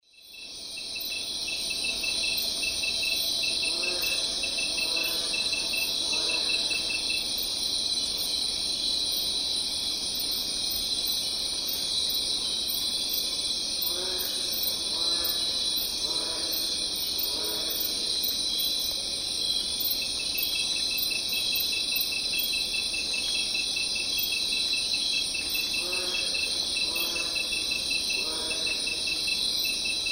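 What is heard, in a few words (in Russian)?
sverchok zvuk, cricket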